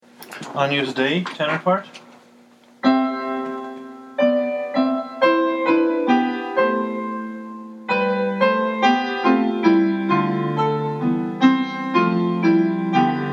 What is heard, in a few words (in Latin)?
agnus dei mass xviii tenor